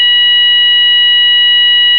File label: toxic gas, fire alarm; garrysmod